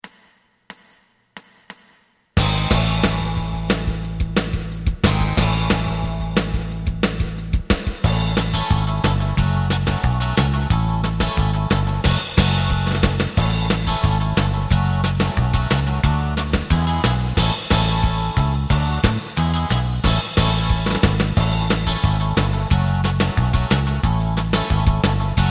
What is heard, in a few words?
lead